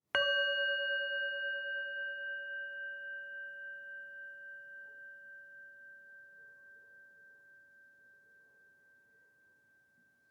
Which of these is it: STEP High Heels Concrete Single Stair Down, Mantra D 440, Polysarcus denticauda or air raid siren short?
Mantra D 440